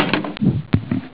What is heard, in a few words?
door; Amiga Soundtracker sample